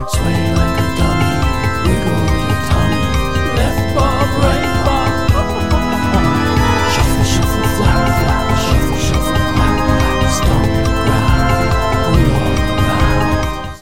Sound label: Jig Ringtone